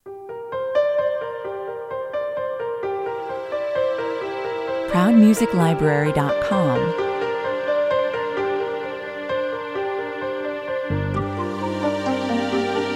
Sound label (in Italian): arpeggiato 116 bpm